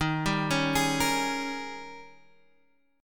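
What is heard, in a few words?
E flat 11th E flat, down, Guitar, Open F; arpeggiated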